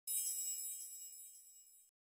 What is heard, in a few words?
powerup; adventurecat; peacefree